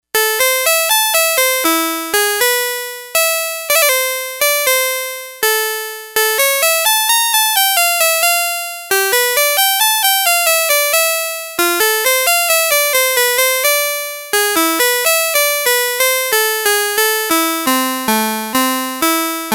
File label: HARPSICHORD